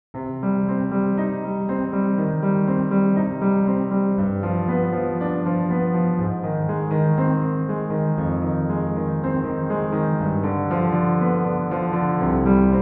Clementi variation 3 beat

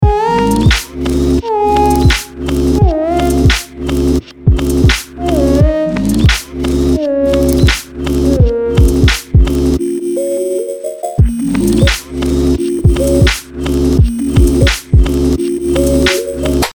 Swipes

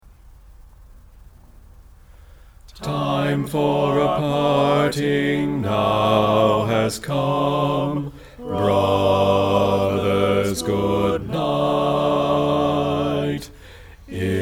Parting Song, Bass loud; Sinfonia

